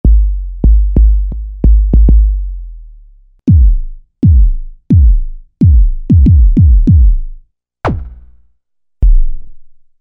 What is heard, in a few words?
Drum medley, Alesis Micron; Factory Programs